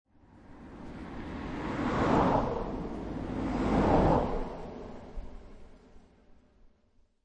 Two Cars By, Two cars drive by on country road. Left to right.; Vehicles